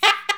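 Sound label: SHENAI, Shenai (Vib)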